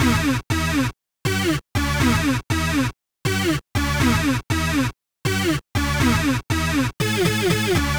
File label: 120 STUB SYNTH